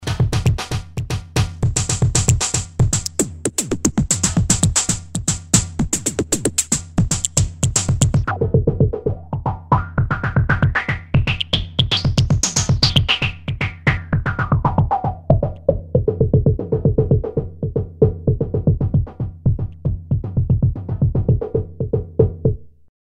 drum various, yamaha cs1x cs2x

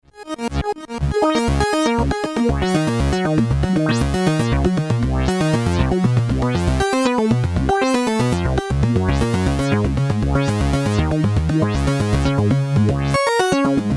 korg z1

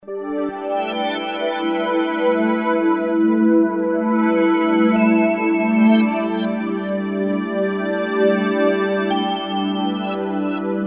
pad bell, roland jv2080

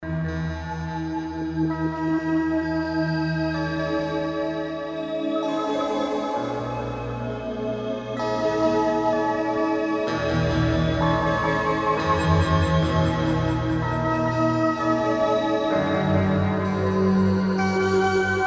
pad void, Roland JV880

